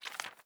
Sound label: paper turn page; Papers Please